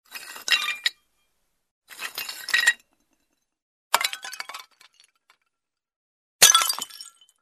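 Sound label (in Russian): zvuk oskolkov posudy, broken dish shards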